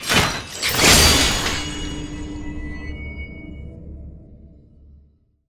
Open Map

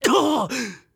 BOSS man hurt

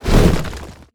BOSS Atk

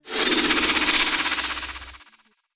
BOSS Death

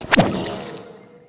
Open Red Bag

